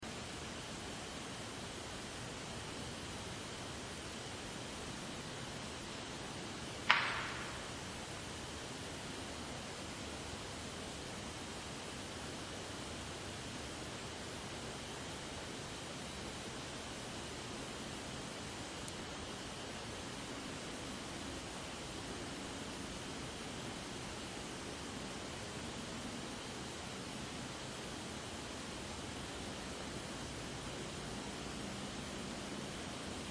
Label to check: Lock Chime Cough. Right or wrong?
Wrong